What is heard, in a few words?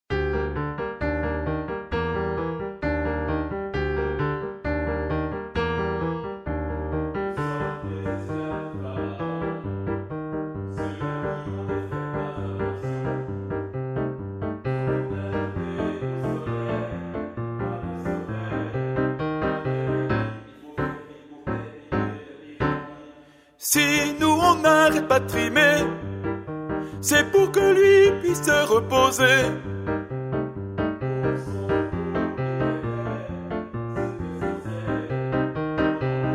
SUB MEZZO